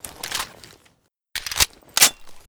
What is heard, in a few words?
deagle reload; STALZONE